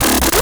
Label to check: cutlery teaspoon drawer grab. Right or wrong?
Wrong